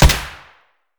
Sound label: gyrza silenced indoor close; Escape from Tarkov